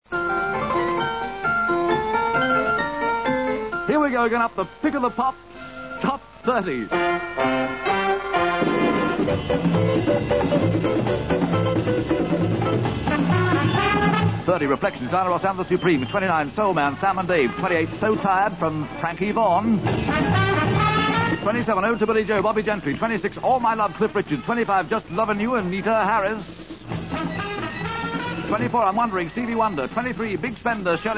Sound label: harp